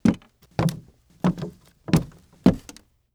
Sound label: Footsteps Wood Stairs; HauntedBloodlines